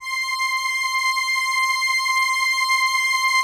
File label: LAMBDA, String Machines